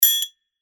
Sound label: Triangle; revealed percussion vol 3